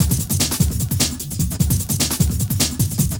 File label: 150bpm, breakbeats